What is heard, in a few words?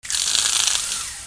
HOUSE